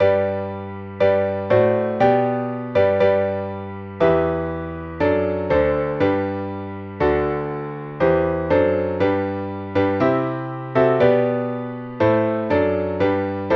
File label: Piano Only; Tollite Hostias; Saint-Saens